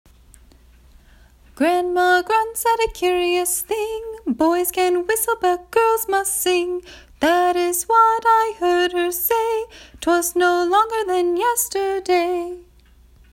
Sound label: Grandma Grunts